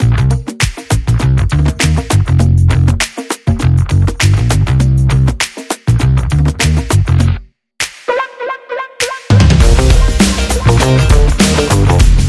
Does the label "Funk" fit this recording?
Yes